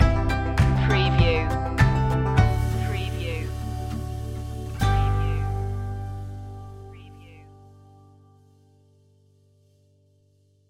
thatll do it stinger

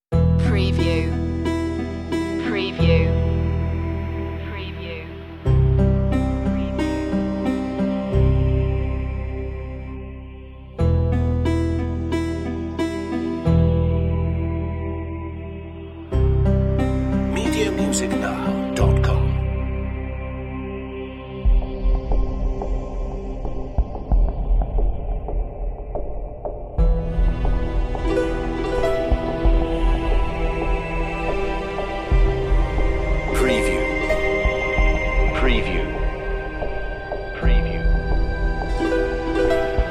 mystery forest acoustic

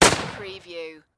Rifle M1 Garand

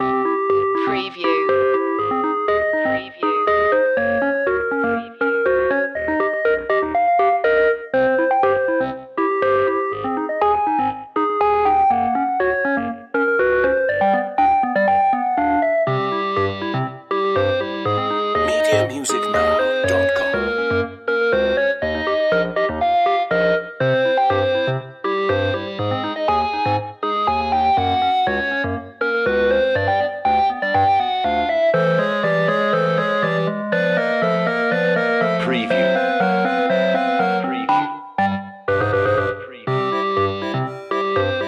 8-bit boss no drums

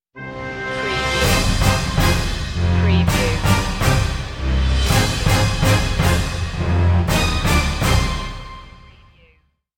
sting no choir

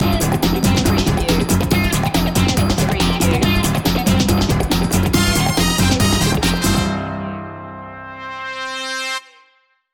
chase loop ending brass

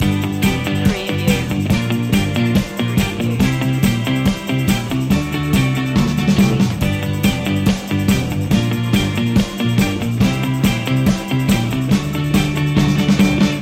rhythm kings loop

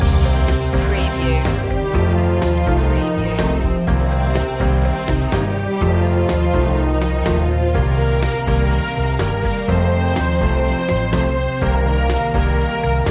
daytime stroll loop

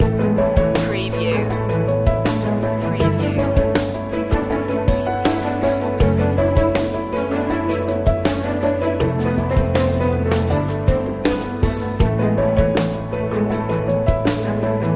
building success loop

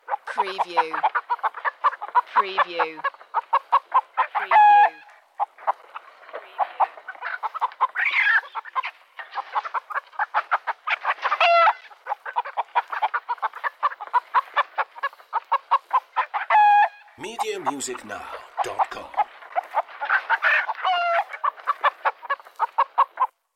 Chickens Excited

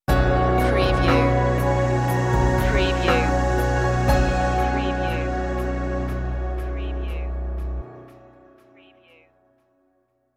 open universe stinger

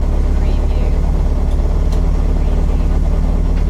Rally car tickover loop; beanstalk audio

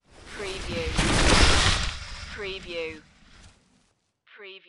Skiing, Snowboarding, Downhill, slide by fast

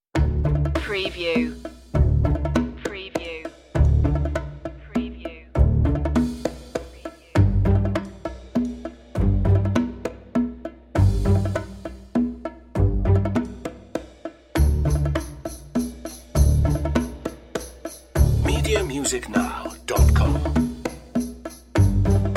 bass perc

